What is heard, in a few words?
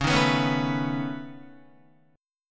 Db 7th Suspended 2nd Sharp 5th Db down Guitar; Db7sus2#5 strummed; JGuitar